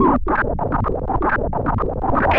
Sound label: Fireworks Shuffle; Sonic Foundry (Sony Creative Software); Ma Ja Le Ethereal Textures